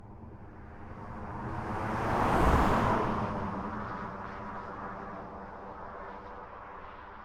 Vehicles, traffic, cars, road, rural, single, moderate speed; MKH8020; Pass-by; Sound Sower